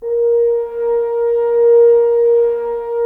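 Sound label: Solo French Horn, Brass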